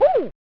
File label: 'Ooh!'; Mickey Mania The Timeless Adventures of Mickey Mouse SNES